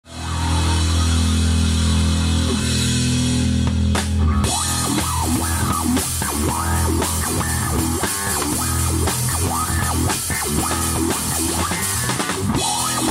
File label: Bob on the Bass